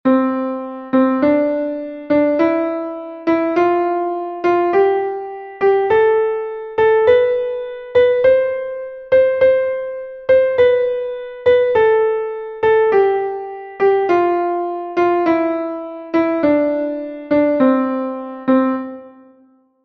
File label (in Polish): Gama C dur